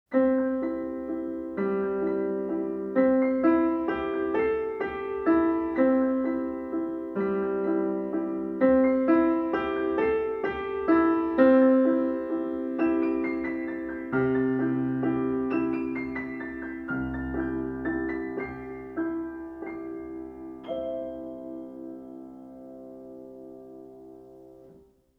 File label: piano